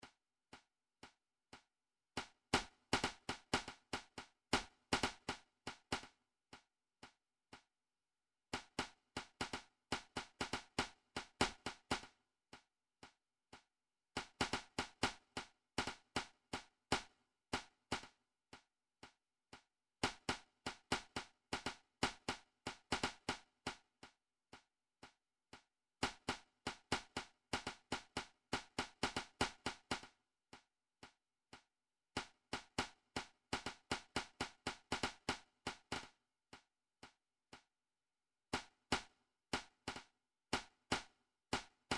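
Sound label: sticks; rumtrax library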